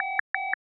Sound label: Beep Phone